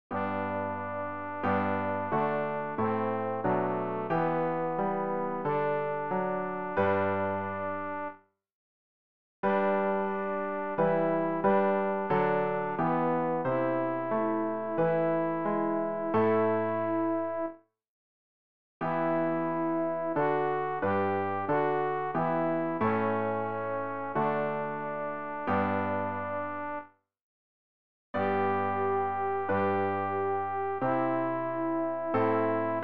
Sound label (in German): alt, Lieder; ref-gesangbuch; beschirm uns gott bleib unser hort